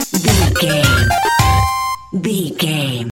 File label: retro musical stinger